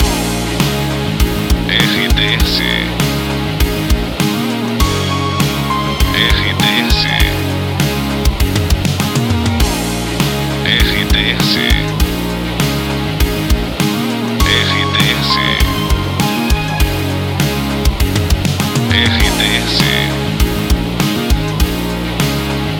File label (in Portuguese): trilha locução; Pacote 92 FM, Timbó/SC. Pacote com característica pop rock